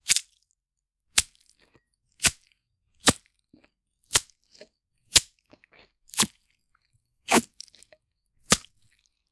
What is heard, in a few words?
slashing a ripe watermelo, fruit